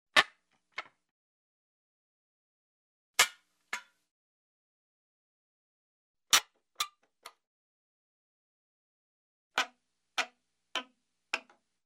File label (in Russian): udalenie gvozdja